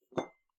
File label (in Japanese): ティーカップを取る音